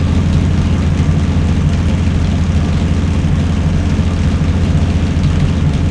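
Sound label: maus engine interior rpm load; War Thunder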